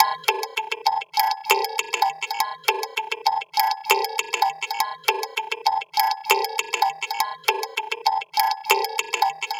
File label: Mbira Echo, Acoustic Beats; Sonic Foundry (Sony Creative Software); Myths of Technology